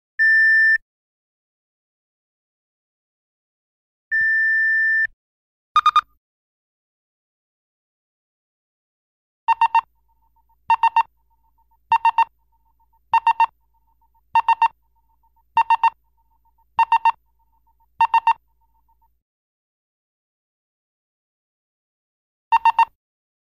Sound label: Computer Device Beep